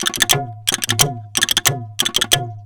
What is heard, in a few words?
090 DHOLAK, Indian Traditions; USB Soundscan vol.45; AKAI